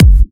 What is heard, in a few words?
Hard Kicks; Vengeance Samples